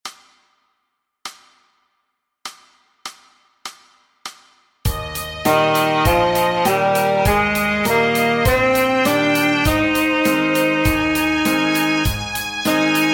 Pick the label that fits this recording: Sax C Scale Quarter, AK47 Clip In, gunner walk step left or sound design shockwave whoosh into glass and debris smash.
Sax C Scale Quarter